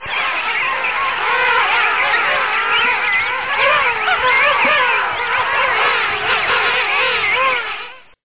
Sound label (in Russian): korolevskie pingvini aptenodytes patagonica